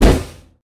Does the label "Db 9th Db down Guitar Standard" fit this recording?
No